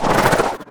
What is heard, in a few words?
shuriken toss; weapons; dota; bounty hunter